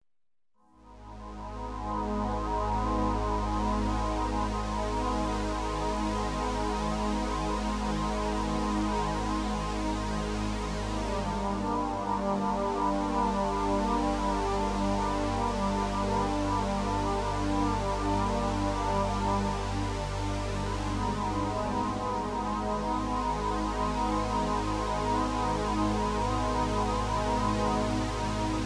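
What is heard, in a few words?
synthesizer melody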